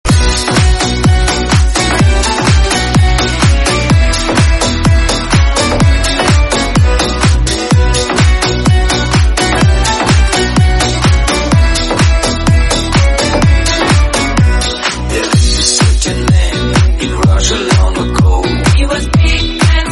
remix bgm

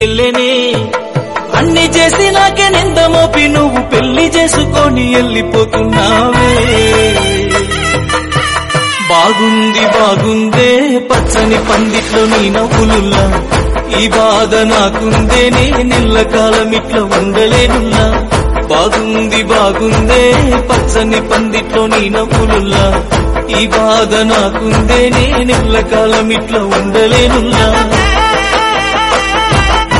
telugu dj remix

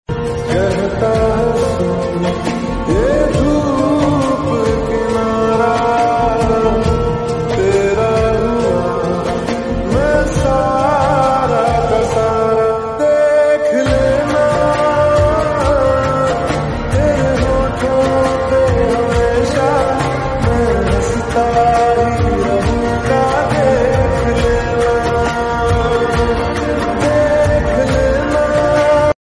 slowed reverb

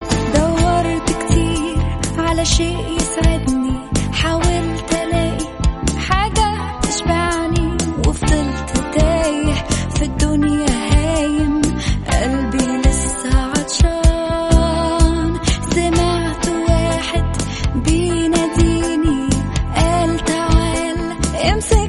arabic christian

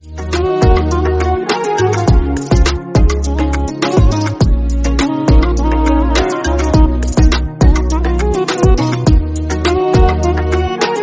classic flute

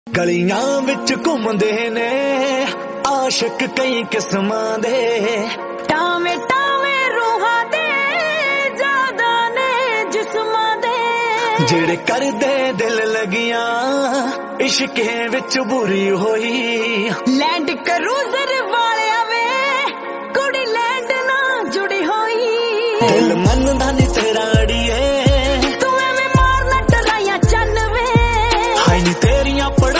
punjabi song

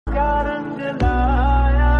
notification

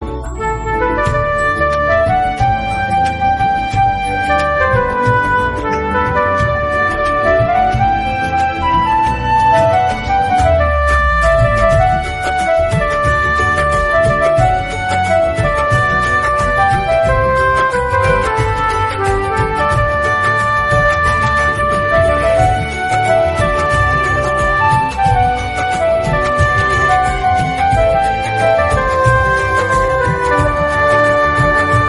instrumental music piano

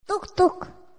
tuk tuk notification tone

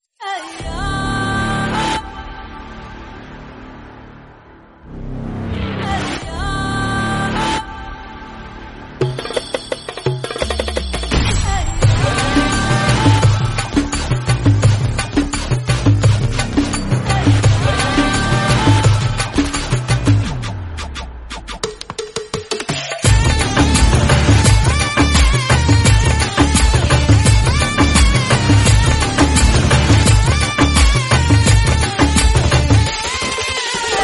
announcement theme, tamil; pooja hegde; beast

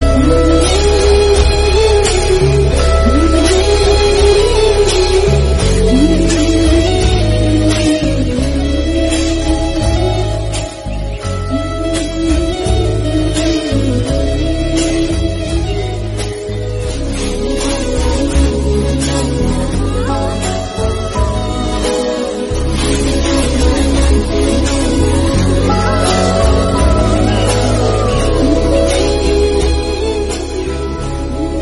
bgm theme; jeans

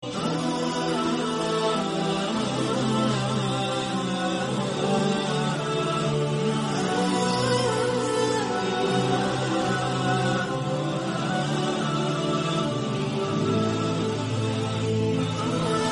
nasheed islamic

